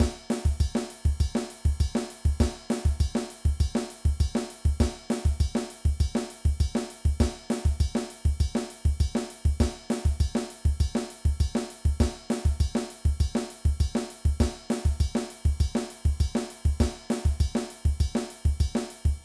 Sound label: Samba Beat